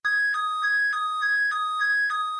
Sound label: alarm short; minecraft